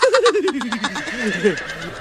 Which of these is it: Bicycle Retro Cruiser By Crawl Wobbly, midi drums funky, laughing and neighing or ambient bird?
laughing and neighing